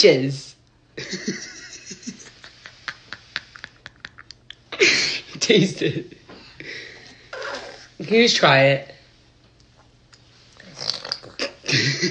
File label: annoyed baby; green screen; audio meme